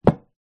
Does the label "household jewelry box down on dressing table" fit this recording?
Yes